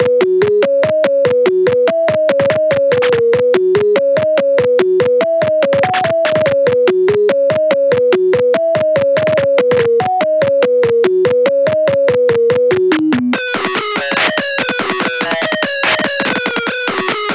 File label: 144bpm Instrumental